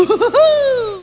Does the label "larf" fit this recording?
Yes